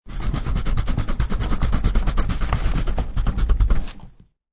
Hard Erasing, Eraser being forcefully used on a piece of paper.; Classroom; Schools